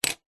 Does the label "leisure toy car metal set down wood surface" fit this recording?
Yes